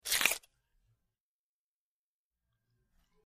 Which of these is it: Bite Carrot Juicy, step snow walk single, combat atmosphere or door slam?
Bite Carrot Juicy